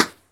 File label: COOL SD; DR.ACCESSOR